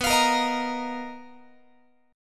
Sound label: B Major 7th Flat 5th B down Guitar; strummed